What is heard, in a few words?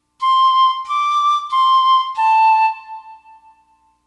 melody recognition